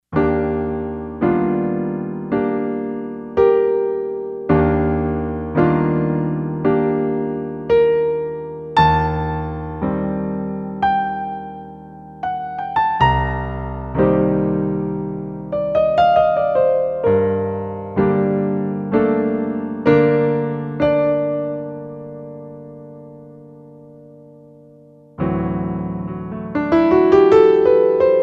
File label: SC Piano, Roland SC-880; Factory Patches